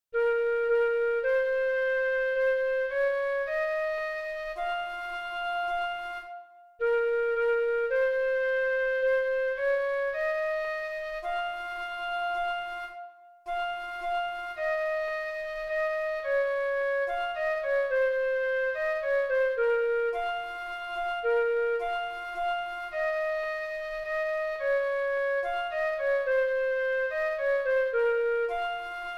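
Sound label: chaconne, flute trio; mattheson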